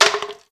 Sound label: Ribs Stn Hit